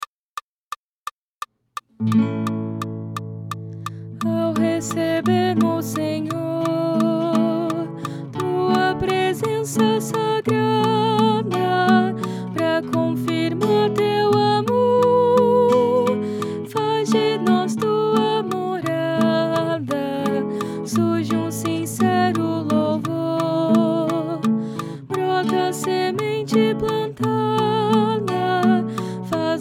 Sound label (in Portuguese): Soprano